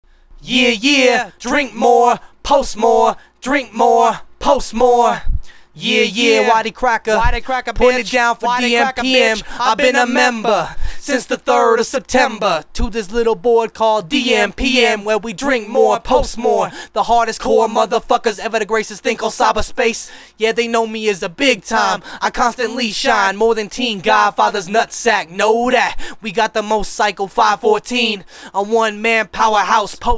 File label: acapella